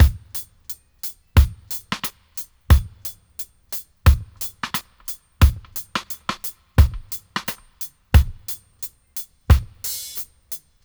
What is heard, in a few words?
88 dry, drum loops, dub; Drumdrops In Dub VOL-1